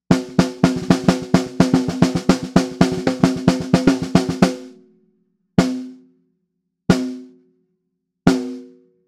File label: ringy morphed